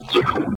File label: eat suck lein; The Neverhood; PLUS! Themes